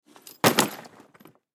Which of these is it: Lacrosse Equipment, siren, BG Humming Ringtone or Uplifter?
Lacrosse Equipment